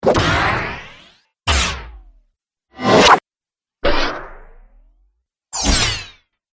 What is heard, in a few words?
Percussive Hits, Sci Fi